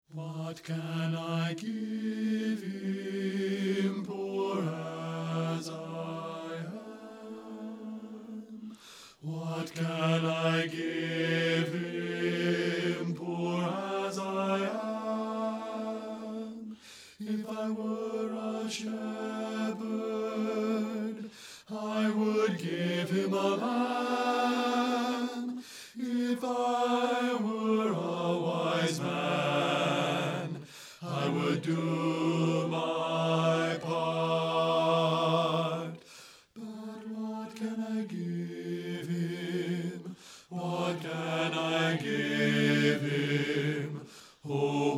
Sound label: bass